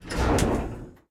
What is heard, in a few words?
Pilon Servo Stop; Puddle; puddle plus ios gamerip 2018